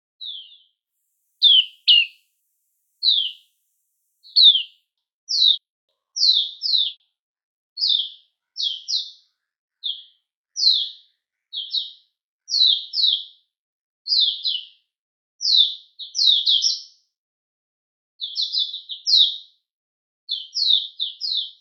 Zostérops de Mayotte; oiseaux terrestres; Shilaputu; faune de mayotte

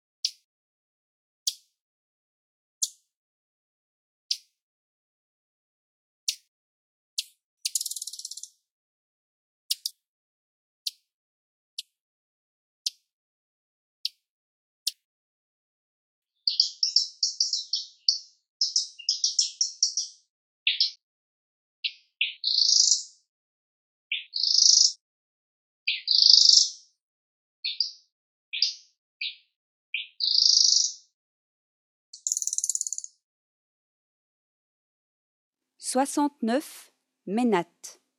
Foudi des Comores, oiseaux de mayotte; oiseaux terrestres; faune de mayotte

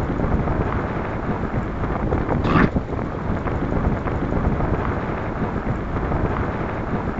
Heron de Humblot Ardea humbloti, oiseaux de mayotte; oiseaux cotiers et marins; faune de mayotte

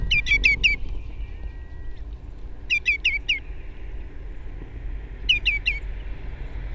Chevalier aboyeur Tringa nebularia, oiseaux de Mayotte; oiseaux cotiers et marins; faune de mayotte

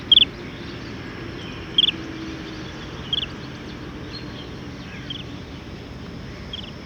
Pluvier de Leschenault Charadrius leschenaultii, oiseaux de Mayotte; oiseaux cotiers et marins; faune de mayotte

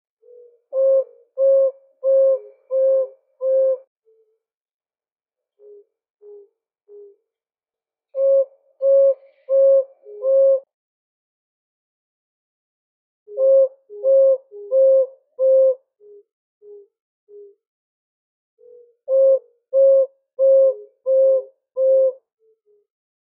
Petit duc de Mayotte, oiseaux de mayotte; oiseaux terrestres; faune de mayotte

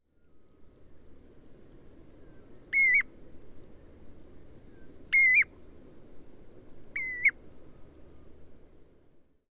Pluvier grand gravelot Charadrius hiaticula hiaticula, oiseaux de mayotte; oiseaux cotiers et marins; faune de mayotte

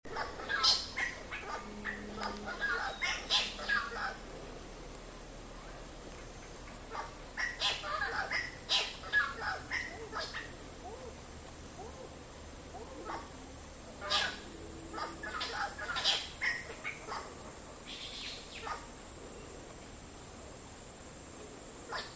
Drongo de Mayotte chant

